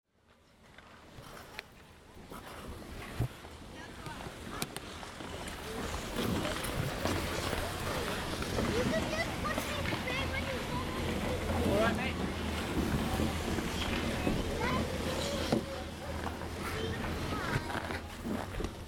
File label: Skating Toronto Canada in Winter